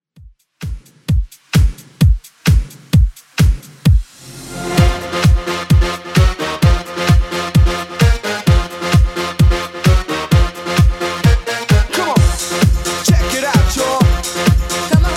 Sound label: Tribal Dance 90s Redrum 130bpm